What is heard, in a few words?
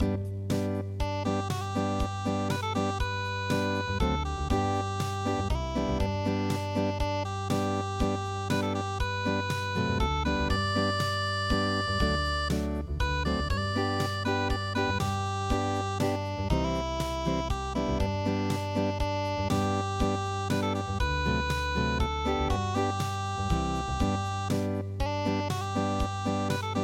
Amazing grace unpluged